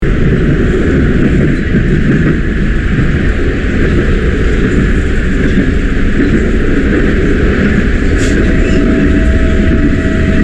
trenes argentinos

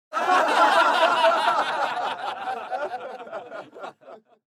risas

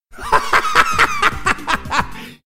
risa simple

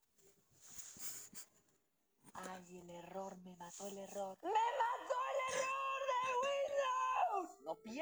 gritando; fnaf robleis meme

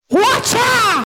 vaca ses

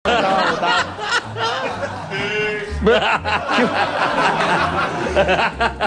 risa; mentiras verdaderas

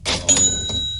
caja registradora

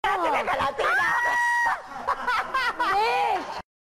risas

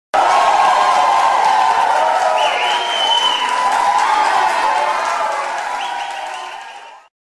gritos feliz

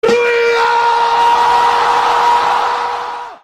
ruidoooo

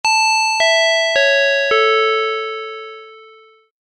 sonido supermercado

